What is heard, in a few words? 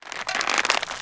Rope Ladder; moul